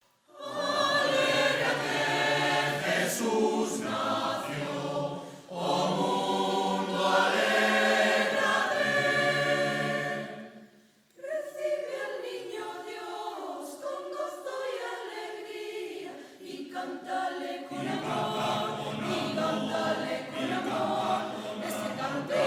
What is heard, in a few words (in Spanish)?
Alegría en el mundo, Coro Polifónico; villancicos